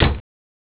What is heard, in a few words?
shut door; angband